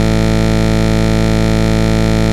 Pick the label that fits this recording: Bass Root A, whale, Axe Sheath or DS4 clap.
Bass Root A